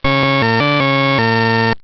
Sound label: bubbles